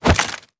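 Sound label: melee attack gun medium; viper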